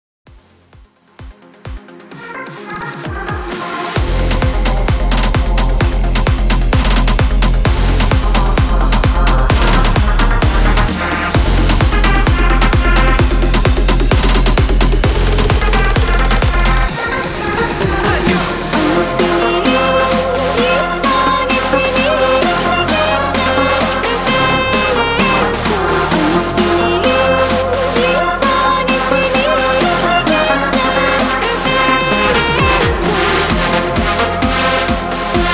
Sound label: 130 BPM